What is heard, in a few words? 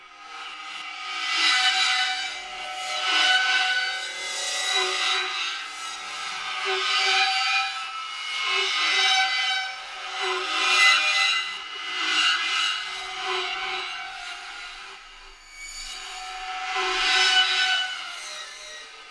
Horror Drone